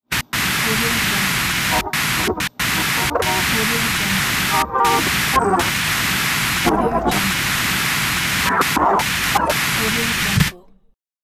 old tv glitch noise

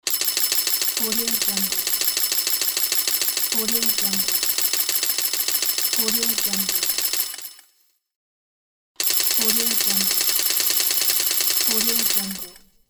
slot machine coins win

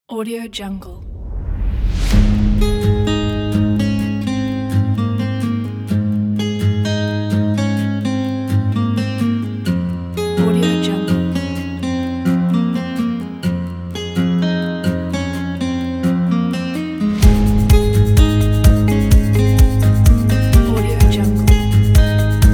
folk corporate

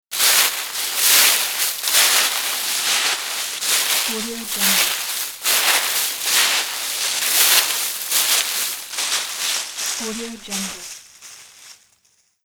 footsteps in crunchy leaves

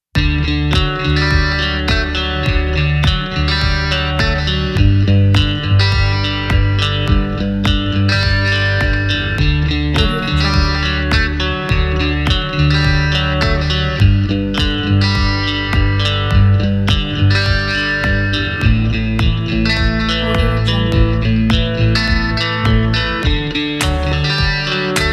short positive pop

